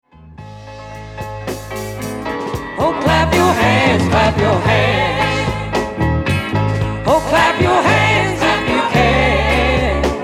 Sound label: Clap Your Hands